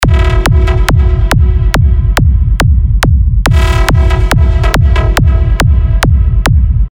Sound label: Stab FM; Revealed Serum 2 Big Room Techno Drops Vol. 1